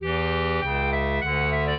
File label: clarinet; Mozart